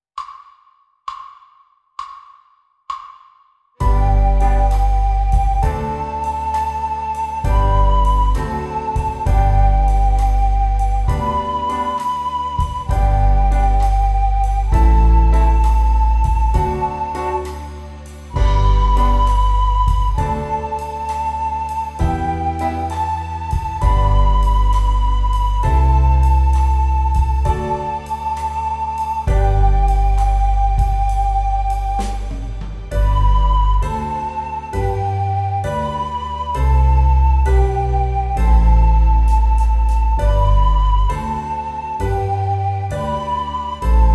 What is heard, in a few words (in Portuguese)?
Flauta Doce Estudo; licoes para flauta doce